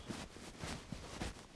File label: Move Sack; Civ4